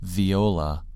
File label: viola